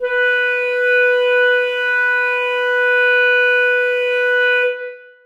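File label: clarinets sustain b4; Sonatina Symphonic Orchestra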